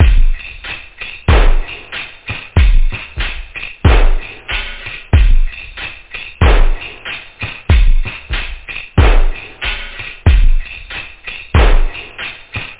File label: basic, loop, house; da capo vol1